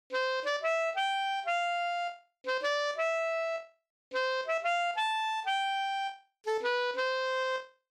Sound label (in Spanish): Saxo Alto en Eb